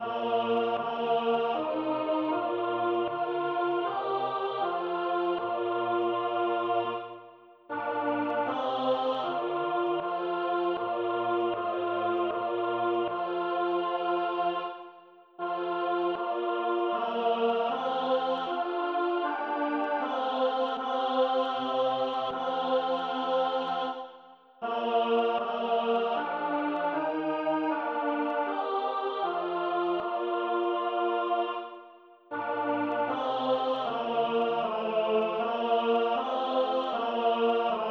Tenor; C.H.Graun; Der Tod Jesu

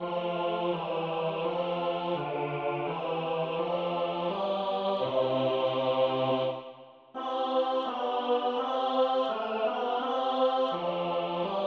Bas; C.H.Graun; Der Tod Jesu

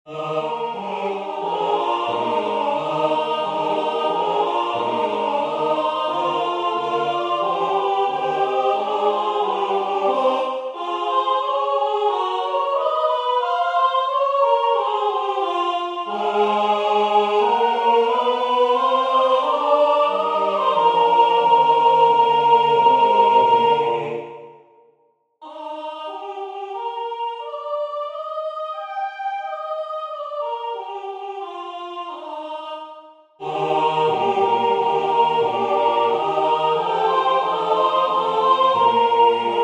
V Dobrym sme se sesli Alt; A.Dvorak

